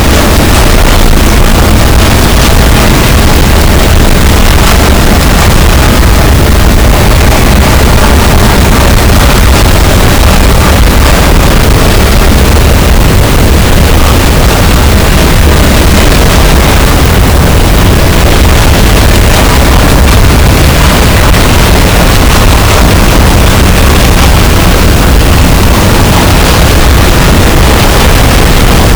cachalot, gaussian noise; Physeter Macrocephalus

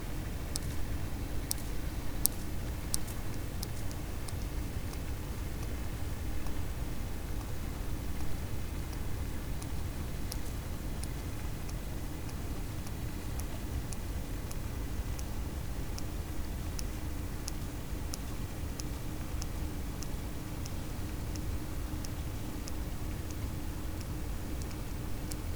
cachalot positif, Physeter Macrocephalus

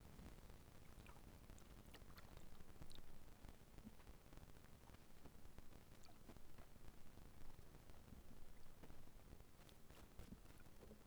test hydro parabole; Voile'Ocean

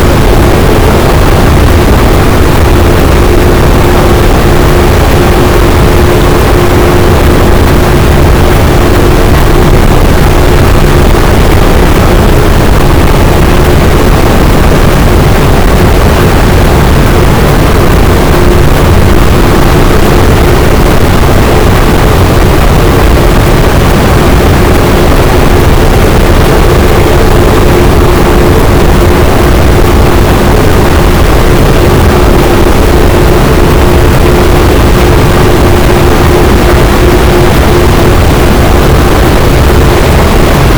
cachalot, Physeter Macrocephalus, gaussian noise

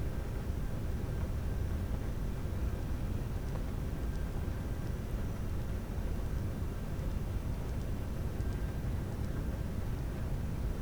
cachalot negatif, Physeter Macrocephalus

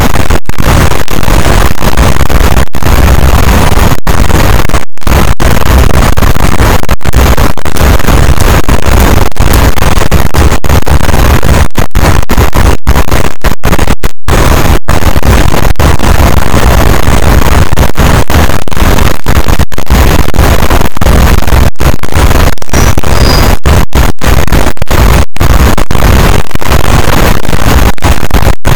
cachalot positif, boat; Physeter Macrocephalus

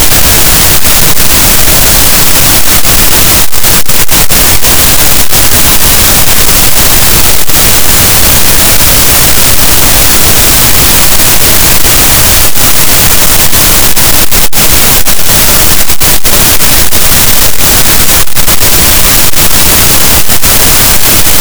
cachalot, Physeter Macrocephalus, gaussian noise

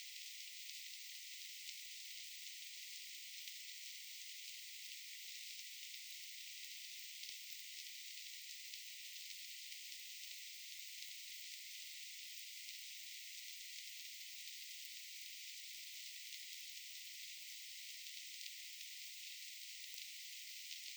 Dauphin clic

